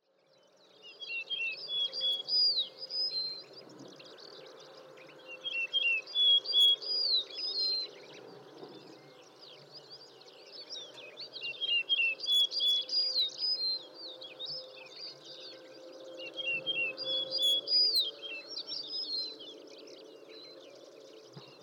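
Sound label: yellow-breasted bunting, ikeda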